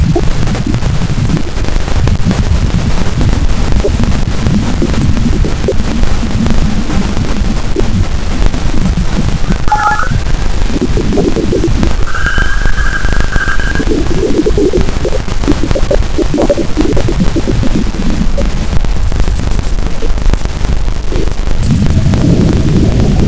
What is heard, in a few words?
Europa plasma waves Galileo